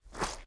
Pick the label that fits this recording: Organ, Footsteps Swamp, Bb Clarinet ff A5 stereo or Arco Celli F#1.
Footsteps Swamp